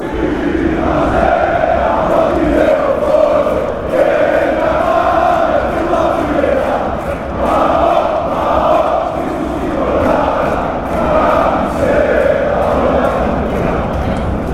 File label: panathinaikos chant